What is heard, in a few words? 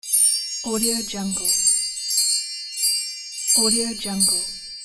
chimes; happymusichappysounds